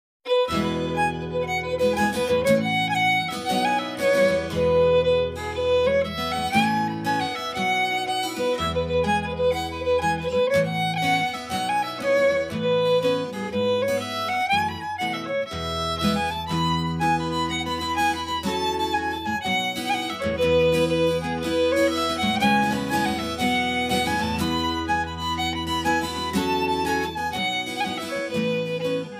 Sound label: calliope; house